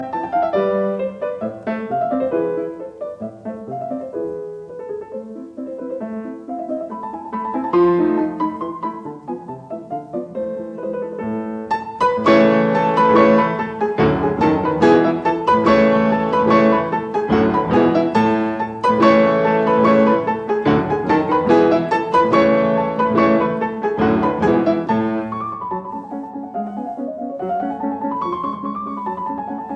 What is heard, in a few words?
Piano Sonata No 11 in A major K 331 III Alla turca Allegretto; Lili Kraus